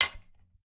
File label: arrow metal, attack impact